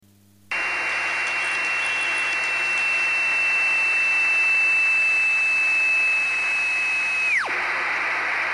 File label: Beacon; Noaa-9